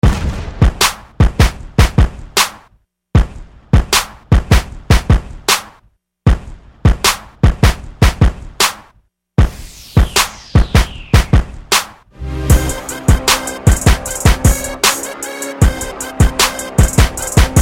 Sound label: crunk